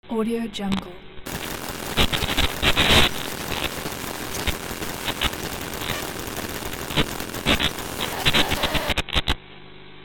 radio frequency interference

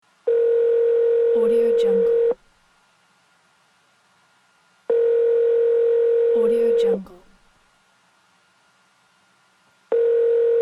phone call

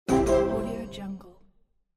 quest done

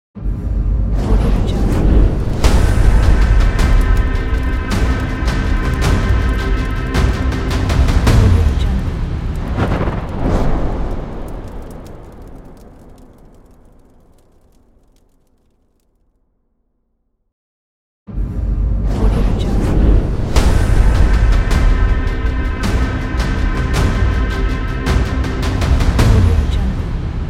epic logo

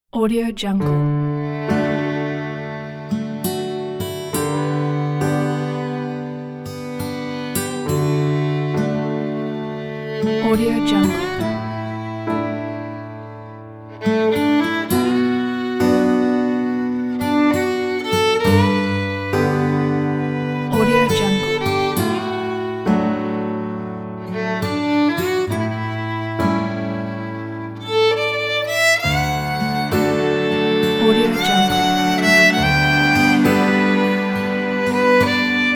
traditional americana folk patriotic ballad

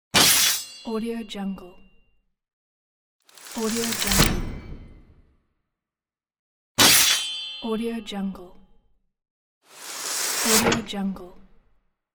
spike trap

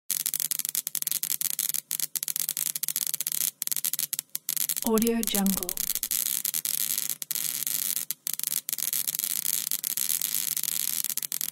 high voltage sizzle

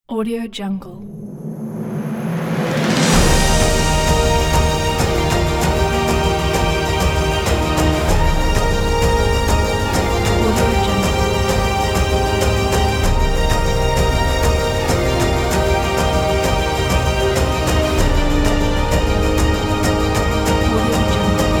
solemn romantic wedding

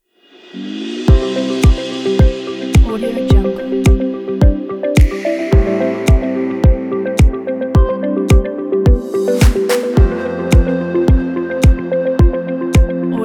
real estate opener kit

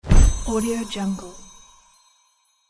magic puff sound

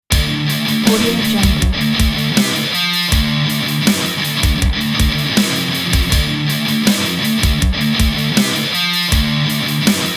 drumstep rock intro; tetraedrum

